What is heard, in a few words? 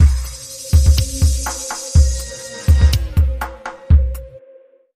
Link Sting